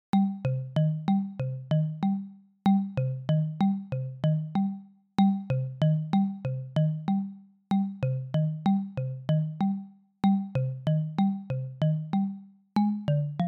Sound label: sixteenth note